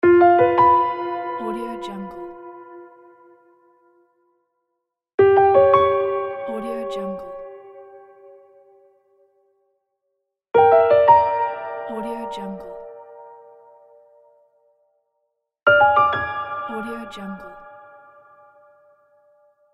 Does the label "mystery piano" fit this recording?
Yes